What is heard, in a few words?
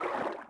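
water walk, footsteps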